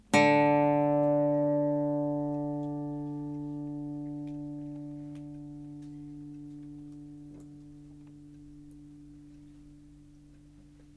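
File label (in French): Electroacoustique re, guitare électro acoustique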